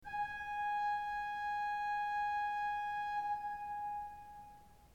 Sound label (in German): ton, orgel